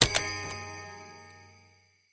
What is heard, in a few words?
spin Button Click; secrets of christmas